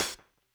HIHAT, drum n bass

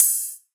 Ride, Rides; Electronisounds

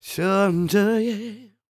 CROON, Soul

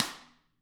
Drums, SNRR; CAMCO

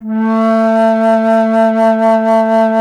Alto Flute, Solo Instruments

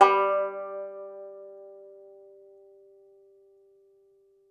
Tenor Banjo

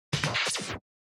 drum Loop 140BPM FX Fill; Vengeance - Essential Clubsounds Vol.5; VEC5 Drumloops; VEC5 Multi Loops 140BPM

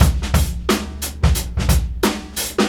TIME STRETC 89, OFFMIKE DRUMS

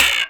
RAIL BUZ; Rarefaction; Poke in The Ear With A Sharp Stick

